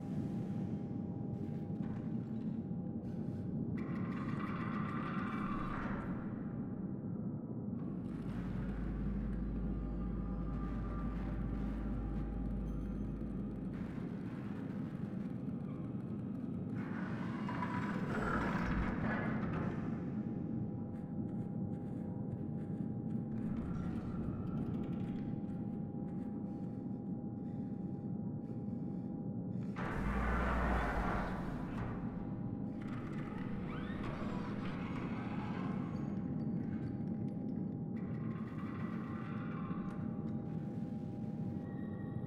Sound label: Ambient Home Loop; Drifting Lands